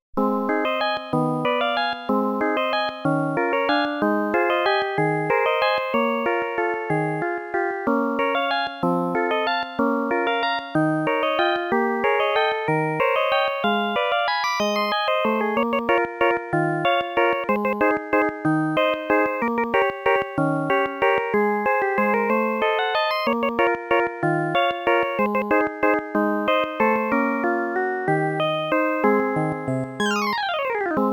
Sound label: Bridal Waltz; dragon quest game music super collection vol.1